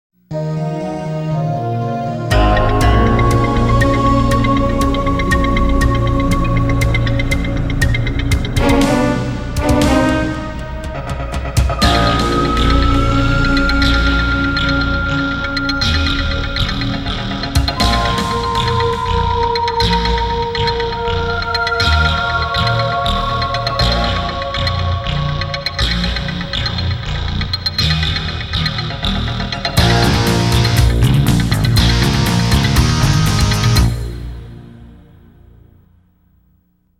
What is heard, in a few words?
Powerup; Trunks